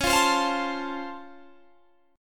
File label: Db Augmented Major 7th, down, Guitar, Standard; strummed; JGuitar